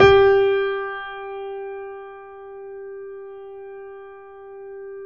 G 3, Keyboards Acoustic, GRANDP ST; USB Soundscan vol.17

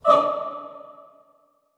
HOO D3, Classical Choir, SHORT HOO; Best Service; Extended Classical Choir

